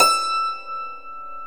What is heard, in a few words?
Hammer Dulcimer E4; Multisamples; Digital Sound Factory Planet Earth